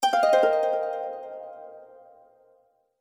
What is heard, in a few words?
Stingers; The Dwarf Run; EV Tobias Appears